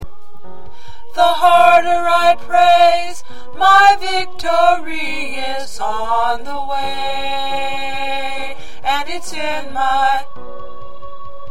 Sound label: Tenor, choir parts